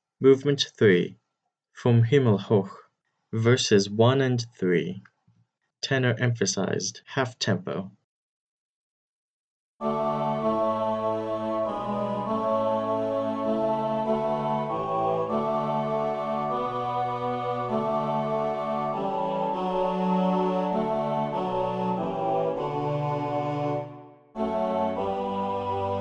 tenor emphasized half tempo

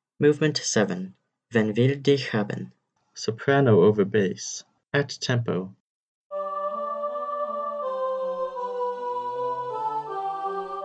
soprano over bass at tempo, choir

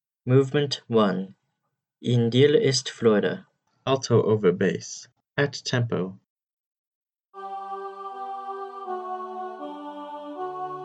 alto over bass at tempo, choir; edwards m a in dir ist freude movement 1 in dir ist freude

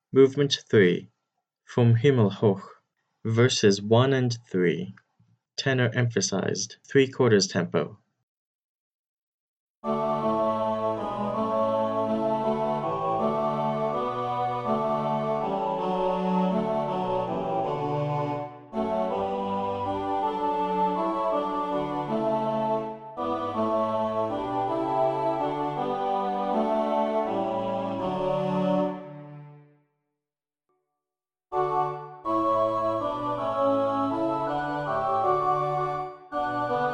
tenor emphasized three quarters tempo, choir

